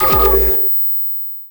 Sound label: Energy Station Activate; Energy & Forcefields